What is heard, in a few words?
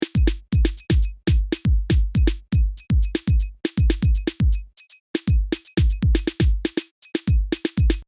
drums